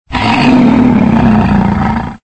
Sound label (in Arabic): أسد